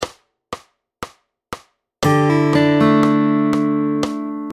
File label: Fingerstyle D Chord Embellishing; Fingerstyle Fun; P3 Amazing D Chord